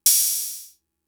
Open Hat, Drum Machines MFB-522; Fricke

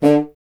C Bassoon; Miroslav Vitous - Solo Instruments VOL-1

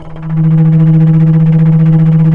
FLT, Bass Flute, Bs Flutter; Roland LCDP04 Orchestral Winds; 90 s Sample CDs

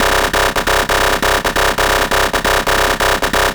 Kaossilator gated (130BPM); musicradar

